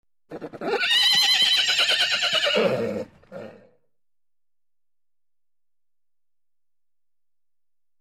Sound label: Horse neigh and canter